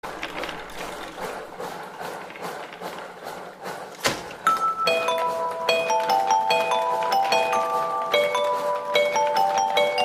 instrument

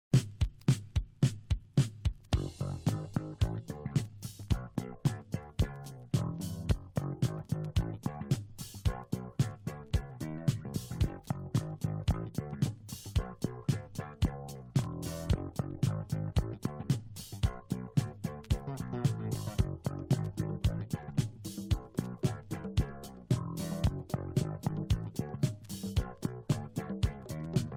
modern soul boogie funk

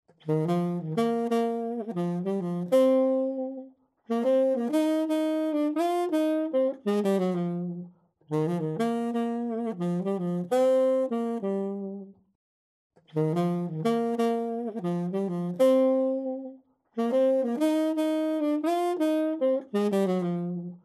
saxo

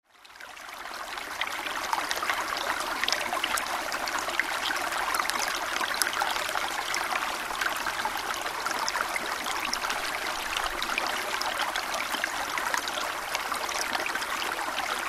sparkling stream in the quiet; salamisound

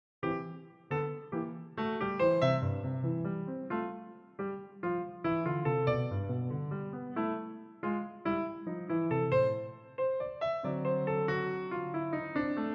Piano; Super Mario Odyssey; Peachs Castle; Synthesia Piano Tutorial

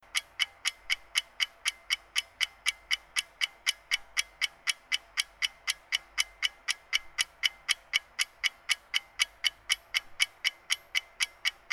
clock ticking

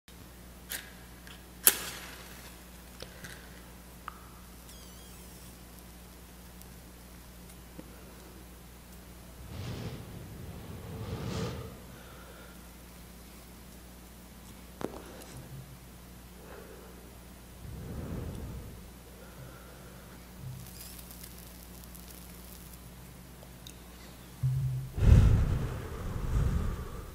smoke

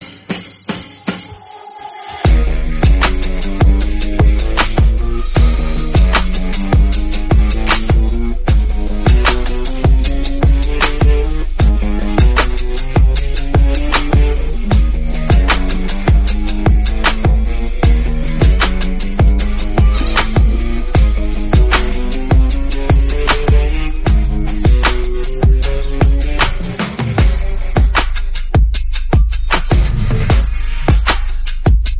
Korean Instrumental

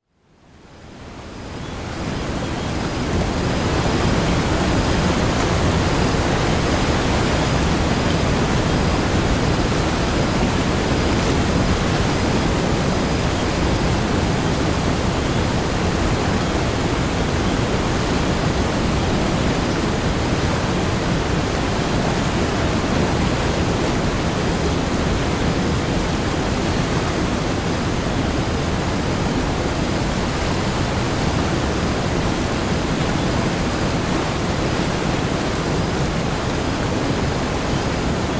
Far Waterfall Sound

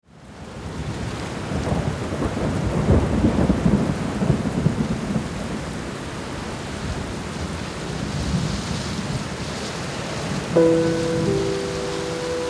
sad piano music with rain and thunderstorm

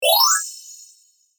power up sparkle

